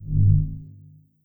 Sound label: Teleport Mono